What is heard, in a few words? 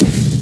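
fireball hit; mk2; JohnCage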